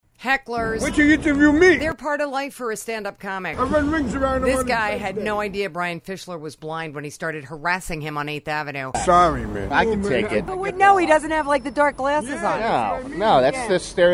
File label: laugh